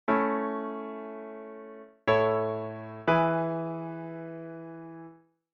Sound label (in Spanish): Semicadencia I a V, A; auditivo de cadencias autenticas plagales rotas y semicadencias; Identificar la cadencia